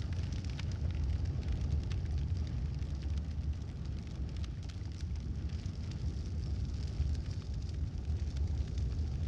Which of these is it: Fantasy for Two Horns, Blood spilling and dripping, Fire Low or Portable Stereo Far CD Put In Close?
Fire Low